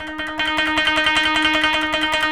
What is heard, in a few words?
KOTO TREM